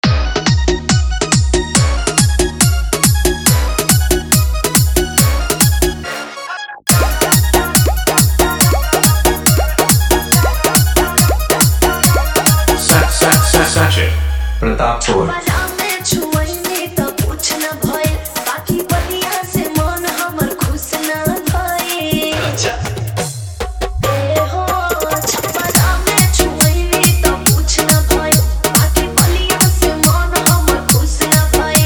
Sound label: Bhojpuri Dj Remix